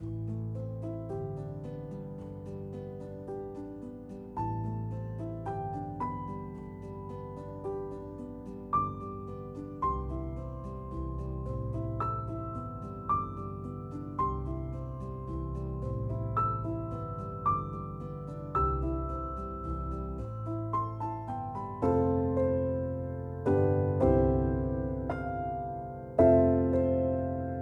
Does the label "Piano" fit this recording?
Yes